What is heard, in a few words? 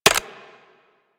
Arch Gun Primary Beam Mech, Weapons; Warframe; Archwing; Hildryn